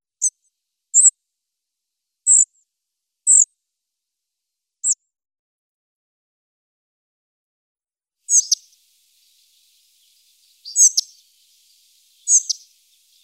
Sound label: Spotted Flycatcher